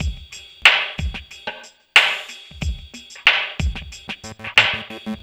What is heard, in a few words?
Beats, Synchro Funk; Finky Five; Sonic Foundry (Sony Creative Software)